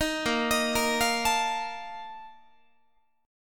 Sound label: A sharp Major 7th Suspended 4th, Guitar; arpeggiated